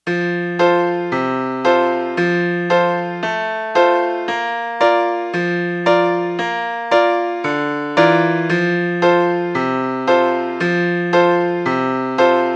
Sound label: Battle Cry in F Beg. B accomp.